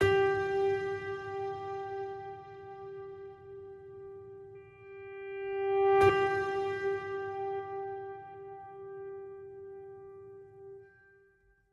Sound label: Just A Piano Note G